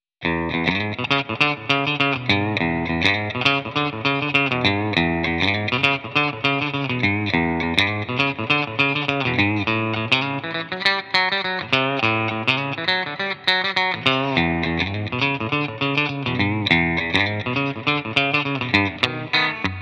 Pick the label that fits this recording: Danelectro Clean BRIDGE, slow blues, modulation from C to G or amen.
Danelectro Clean BRIDGE